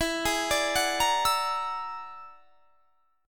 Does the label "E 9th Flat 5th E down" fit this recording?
Yes